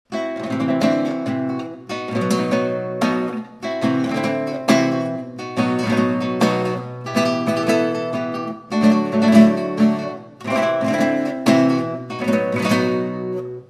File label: tangos B type